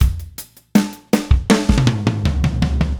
Slow Groove Beat, Rock Drums

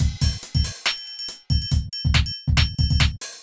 r and b

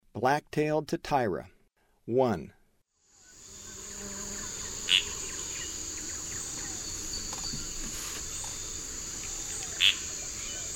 Black-tailed Titya; S. America; Eastern Lowlands

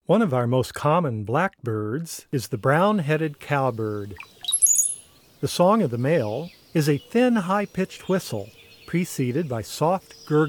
Brown-headed Cowbird; North America; Common Western Species; Know Your Bird Sounds

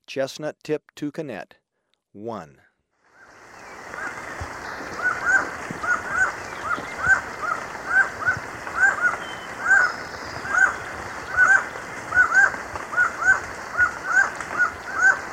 Black-mandibled Toucan, Birds of Ecuador; S. America

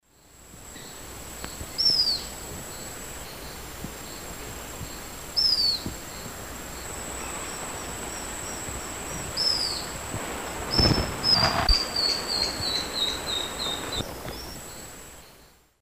Neopipo cinnamomea, calls and song pair; S. America; Eastern Lowlands

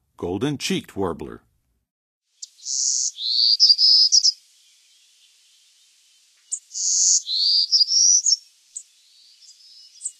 Golden-cheeked Warbler; North America; Western USA; Western USA Songbirds

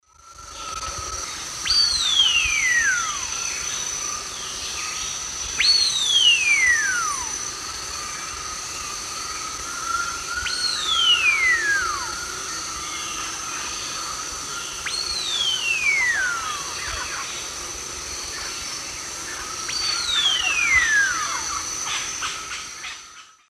Crax globulosa, song; S. America; Eastern Lowlands

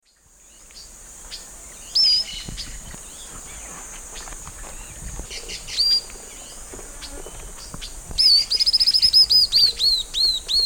Brachygalba lugubris, call and song; S. America; Eastern Lowlands

